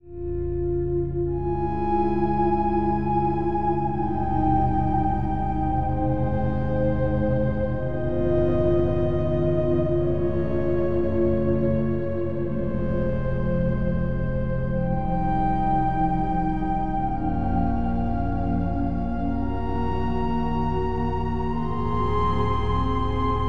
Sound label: MW SubHarmonic